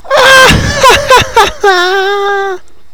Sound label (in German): LACH